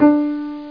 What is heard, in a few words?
key